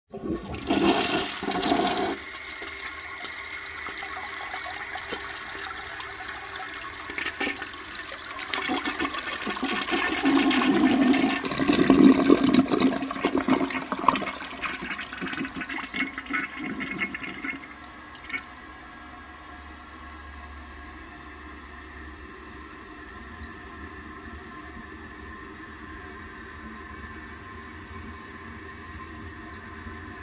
Toilet Flush Old Type w Long Flush & Tank Fill Up, Household